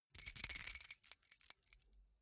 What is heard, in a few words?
key ring small rattle air long